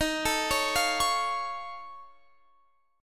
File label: F Augmented 7th D sharp, chord